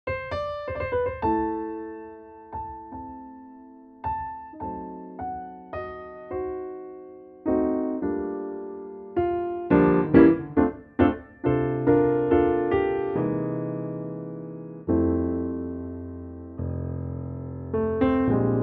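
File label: F piano, male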